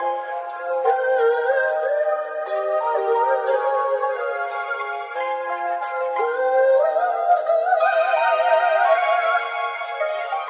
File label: voice and keys ali ocean wow; Loops; Phonky